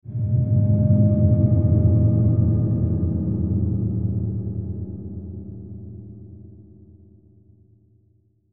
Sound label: SWELL DARK MET, Swell Dark Metallic; Production Element Imaging Element Accent Transition